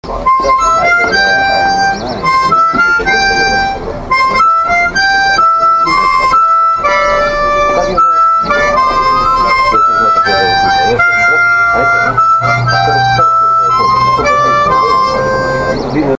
diatonika like tremolo, harmonica

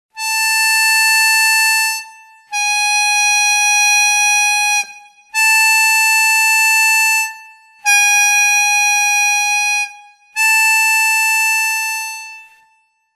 bend, harmonica

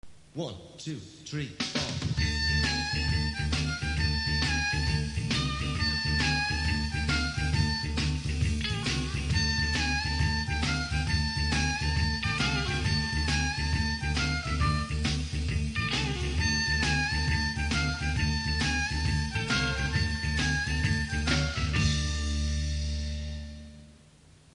little blues, harmonica

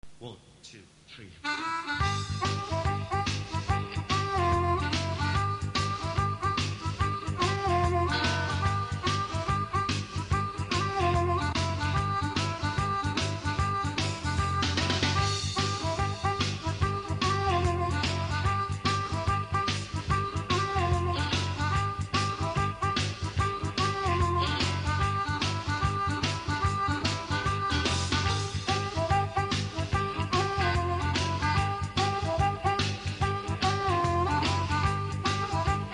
chicago blues stomp, harmonica

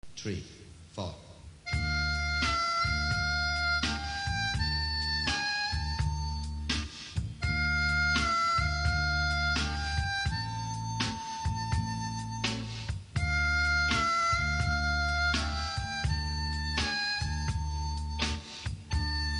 harmonica

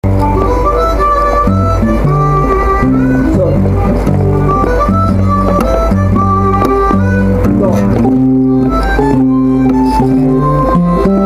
blues no bands, harmonica

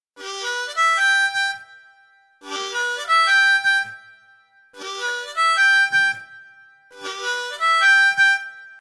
Tongue, harmonica

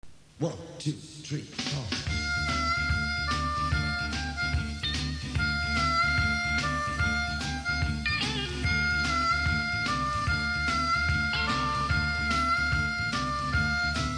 harmonica

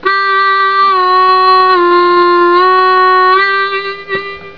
2 draw, bend, harmonica

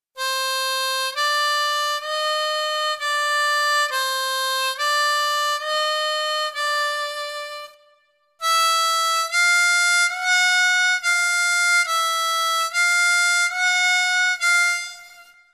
Overblow, harmonica

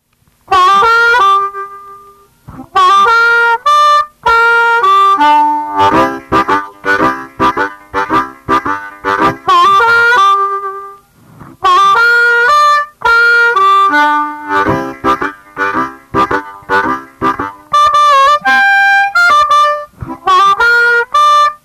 first blues, harmonica

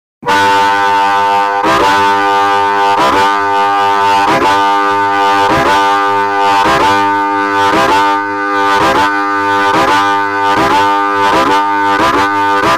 train, harmonica

